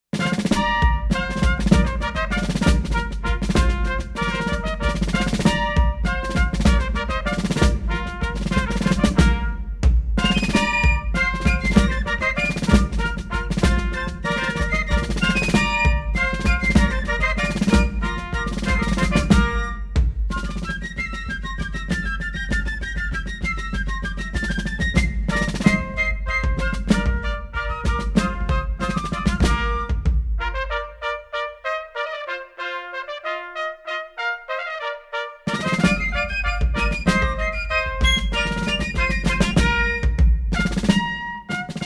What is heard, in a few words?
Brandywine Quickstep, Fife and Drum Corps; The United States Army Old Guard Fife and Drum Corps